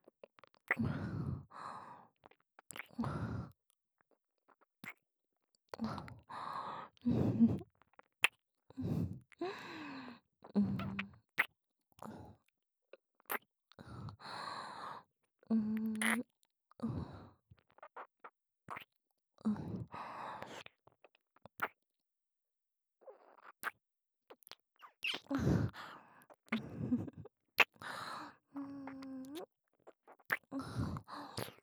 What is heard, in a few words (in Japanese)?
耳キス(左耳)